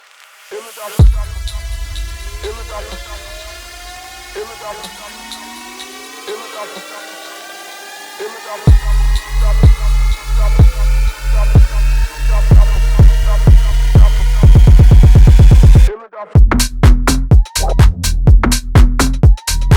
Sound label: workout music gym tabata songs funky house workout mix